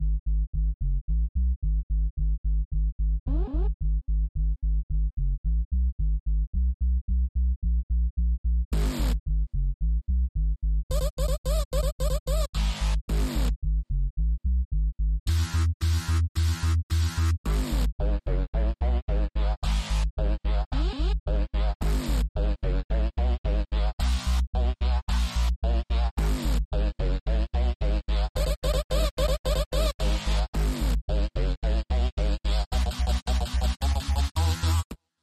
House Synth 110bpm

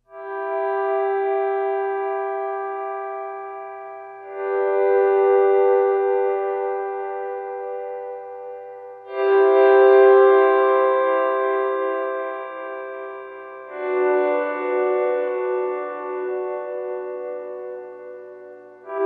oscillation

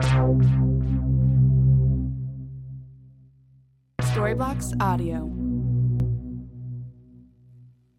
Ambient House Synth 120BPM C

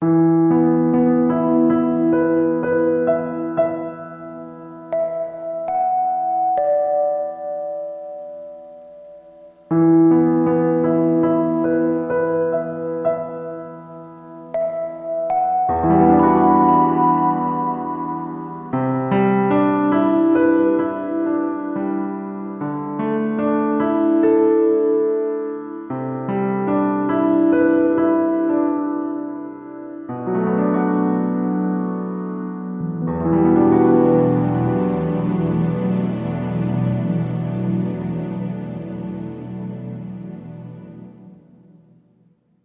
melancholy

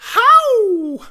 Rap Vox

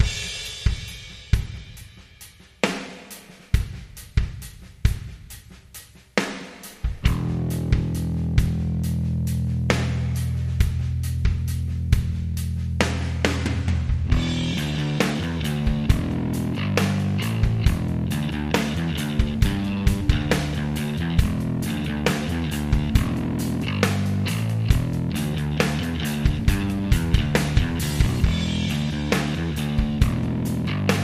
drums bass

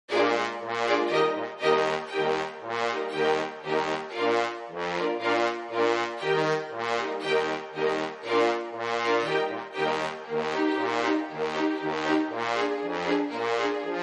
old timer two step

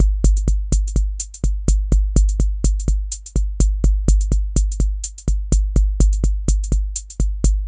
Ambient House Mixed Beat 125BPM

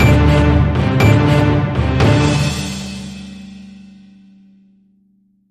news music theme outro

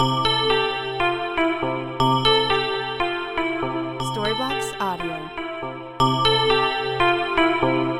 Ambient House Lead 120BPM C

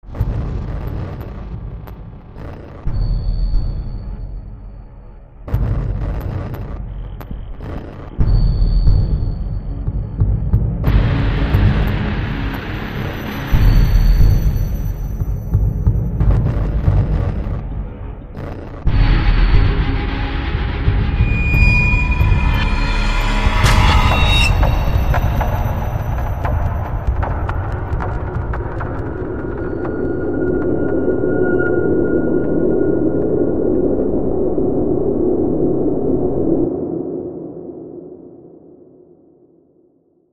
industrial

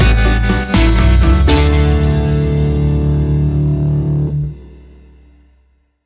Fifties Feeling Stinger

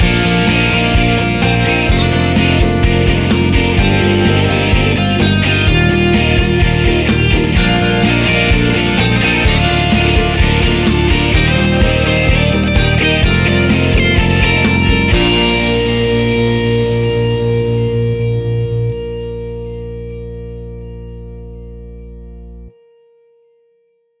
Light Fun Pop Rock; bbc

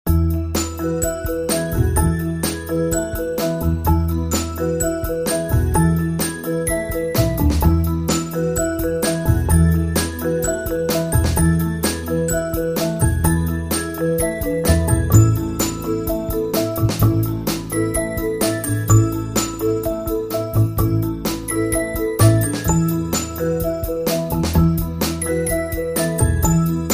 perky perky

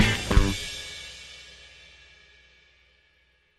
flat down stinger

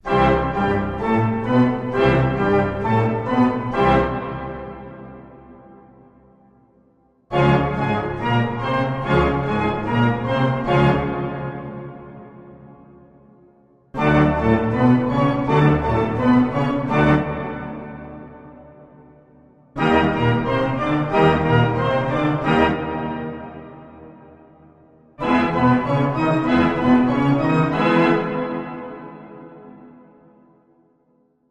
organ team cheer; building; vamped version

